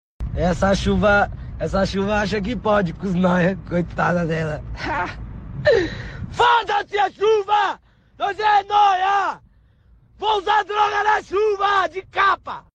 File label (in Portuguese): essa chuva